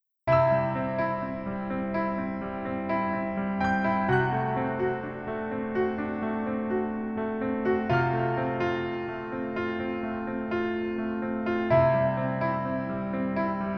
Piano ver 멜로디MR -1키

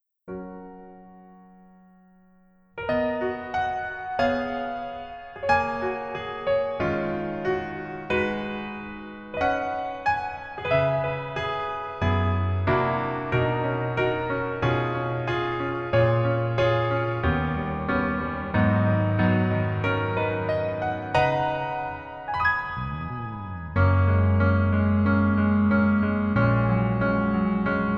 Piano ver, -1키; 더킹, 영원의 군주

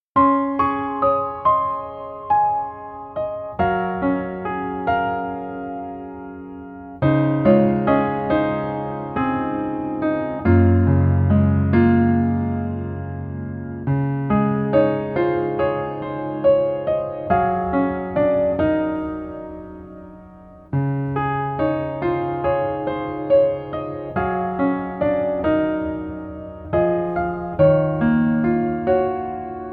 Piano ver 멜로디MR -1키

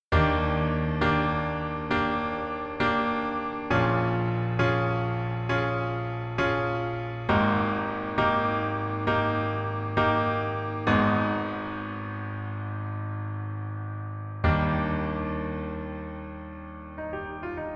Piano ver 멜로디MR -1키

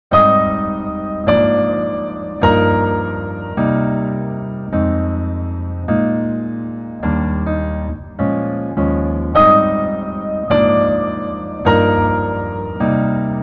Piano ver, -1키

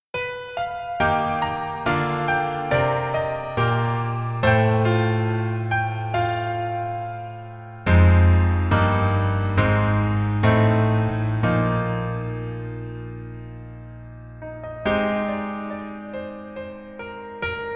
Piano ver 멜로디MR -1키

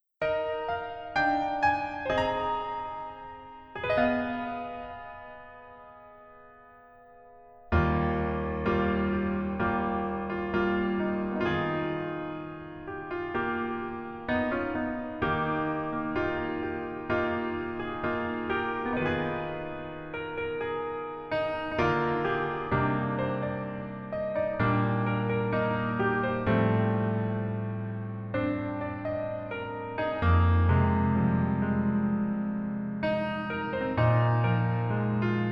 Piano ver, 멜로디MR

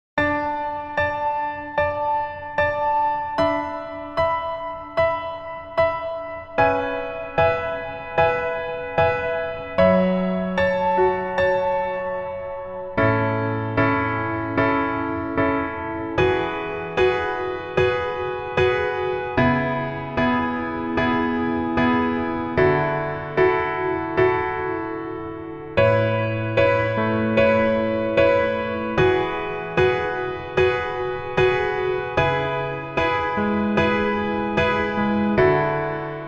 Piano 여자키

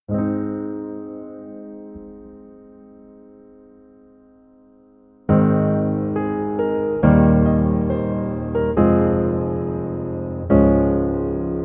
Piano ver, 멜로디MR

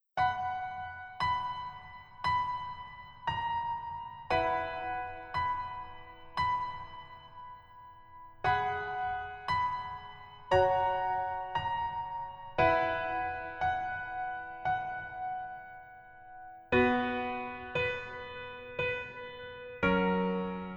Piano ver 남자키

